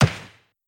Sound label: Ball Kick; genesis; euro Golden Cup Desktop